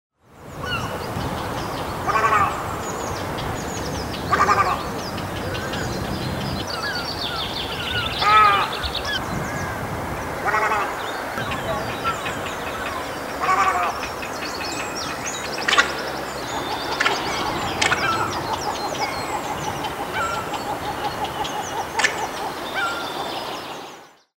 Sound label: little Egret